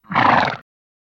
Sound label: Horse Snort Generic